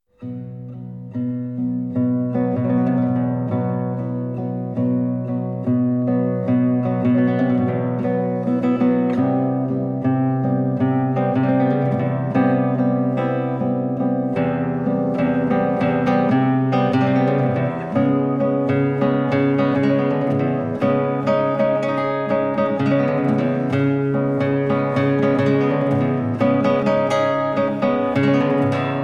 Etudes pour la guitarra; No. 10 Animé Version de 1928

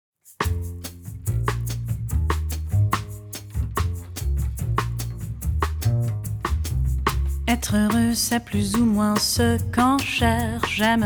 Samba

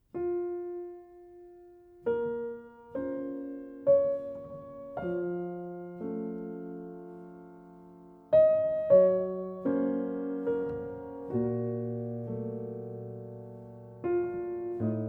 Waltz

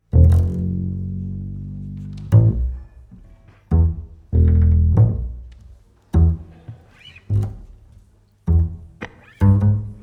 Swing

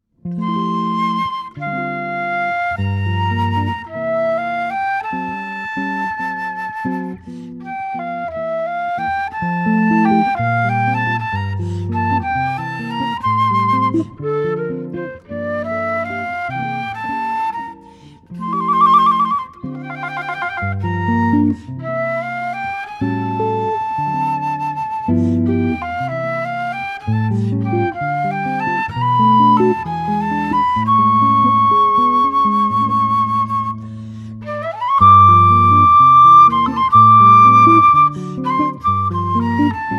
JAZZ À LA FLUTE

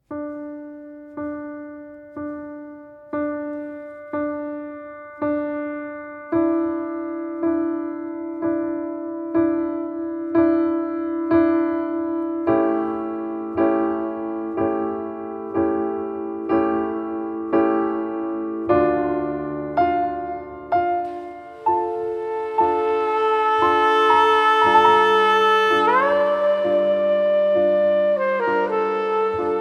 Improvisation; Walking Far; Improvisation on A. Marcello J.S. Bach

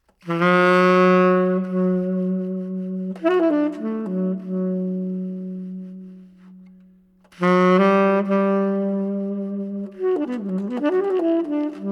Improvisation; Let Me Weep

